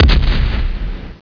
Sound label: bowser fireball